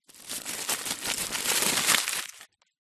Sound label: food easter egg foil unwrap